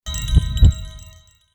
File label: Creepy Percussion